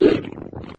pain, flood

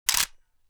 mp5 40cal bolt back

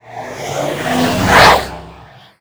conjuration cast

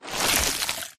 human form short move; flood

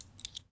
raging bull jiggle

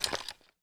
generic grip heavy, weapons handling

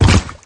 limbs, flood

